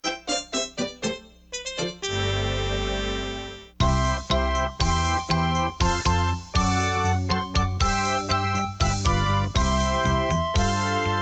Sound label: Instrumental; 2024 VBS